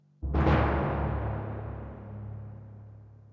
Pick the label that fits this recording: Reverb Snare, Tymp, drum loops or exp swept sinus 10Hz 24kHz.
Tymp